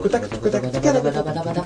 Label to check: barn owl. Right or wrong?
Wrong